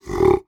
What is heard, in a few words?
Animal Primate Ape Gorilla Snort; animals; AdobeCC